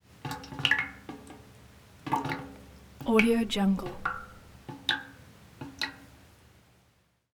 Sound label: water drops drips into metal bucket of water; prosoundfx